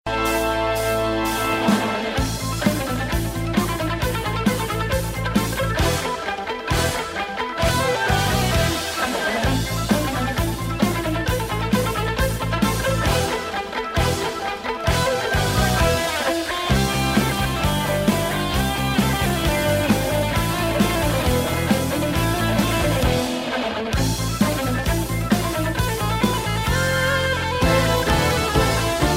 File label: turkish march; bosnia